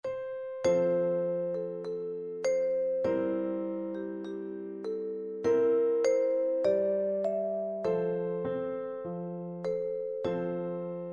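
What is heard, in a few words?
vibraphone; percussion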